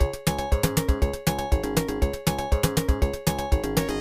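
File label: background music; Block Block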